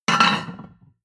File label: plate on plate place slide hard